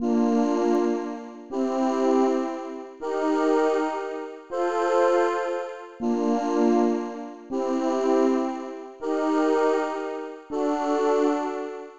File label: Chords and Bells